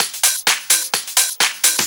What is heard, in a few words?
Discohouse Loop 128 BPM